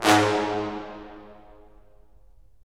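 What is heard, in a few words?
brass cimb, Roland; Roland LA Composer vol. 4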